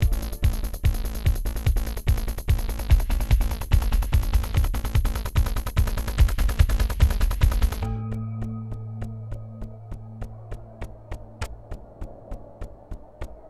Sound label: Chopper